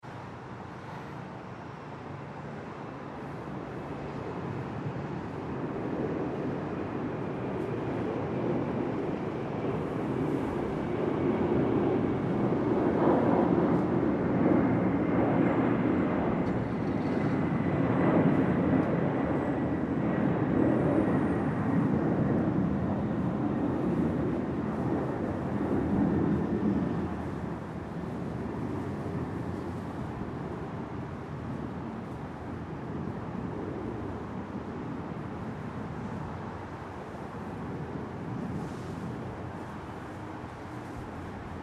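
Urban Background: General city soundscape. Jets overhead; Lax; buses and distant traffic; Urban Background Lax Long; Part of the LYN105 package of City; City Sound Effects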